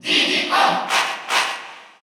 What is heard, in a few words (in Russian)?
Cheer Russian; Diddy Kong; SSBU